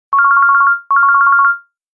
Ring Ring

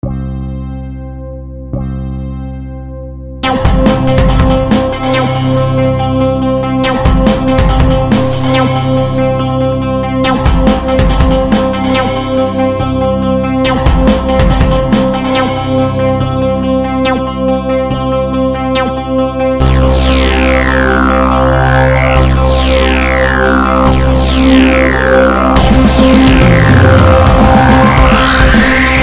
Long Horn

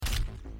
Shotgun; Doom Eternal

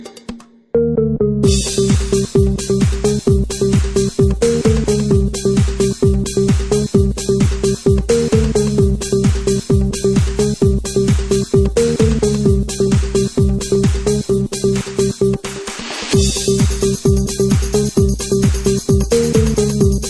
Funk Bass

